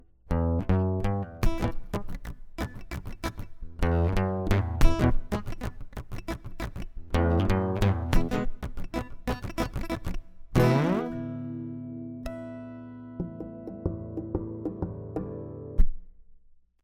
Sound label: guitar scratching